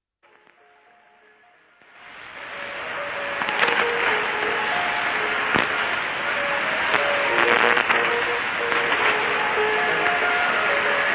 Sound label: R La Merced Tongod 6755 kHz; Peru